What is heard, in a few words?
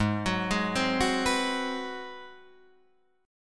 Ab 7th Sharp 9th Guitar Open D, chord